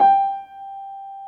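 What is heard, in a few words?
piano G1, soft piano; AKAI S-Series CD-ROM Sound Library VOL-2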